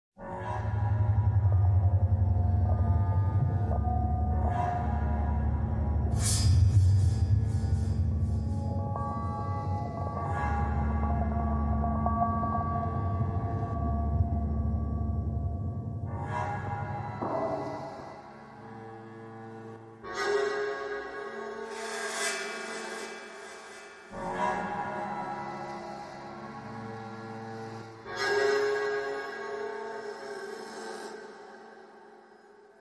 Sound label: FX Drones Only